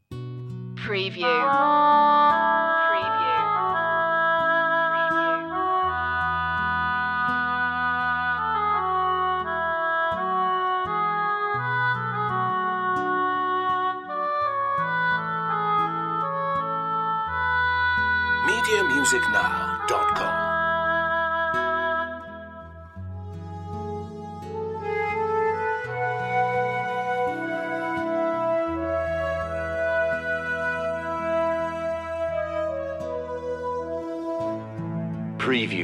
gentle sounding

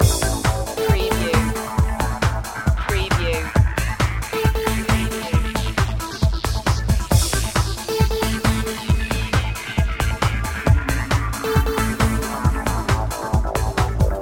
electricity loop

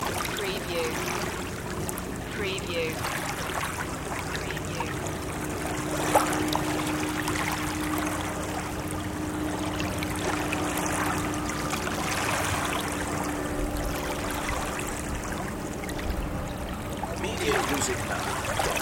Lake Waves

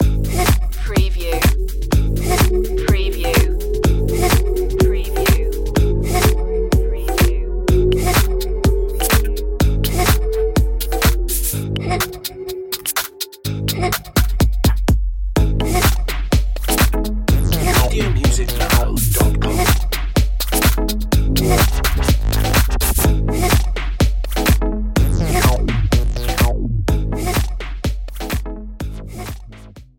bass bots

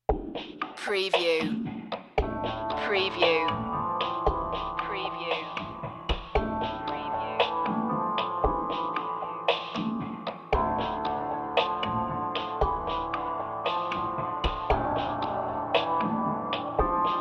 inner mind piano and groove

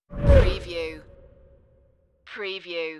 Wipe

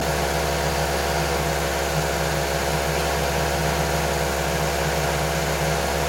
Car Mazda 3 Low Rpm Loop

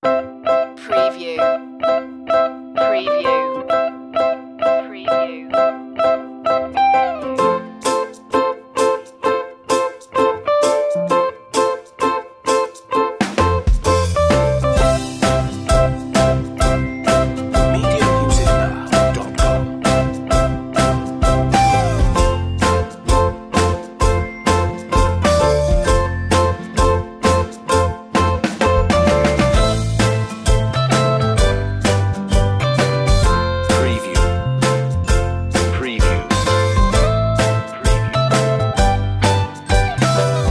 the ukelele commercial

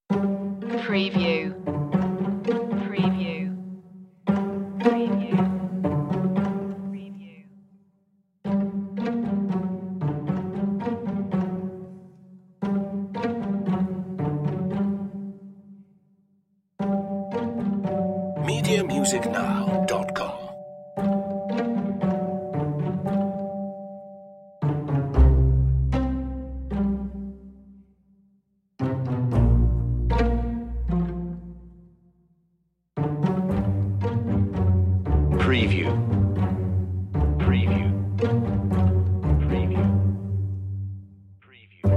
mysterious critters no perc

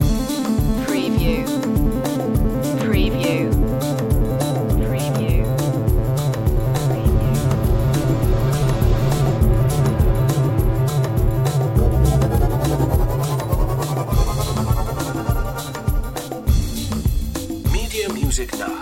teleportation loop

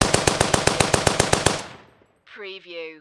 Machine Gun Vickers

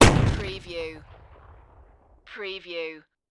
GRENADE EXPLOSION

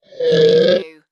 Monster Groan Pain

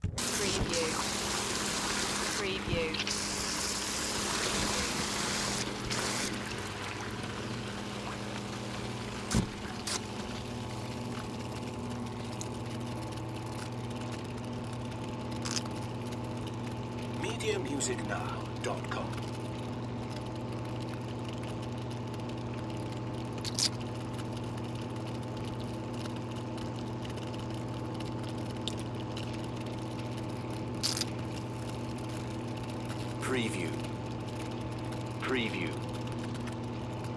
Water Pump Run; Sound Effects Bible

